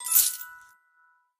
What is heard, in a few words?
coin collect; jewels